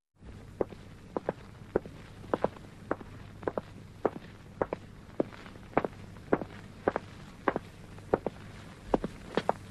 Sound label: walking